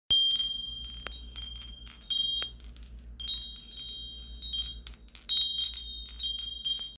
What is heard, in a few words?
copper spiral